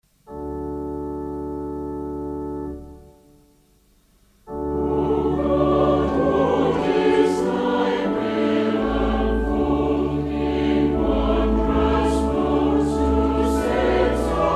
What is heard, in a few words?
Choir, hymns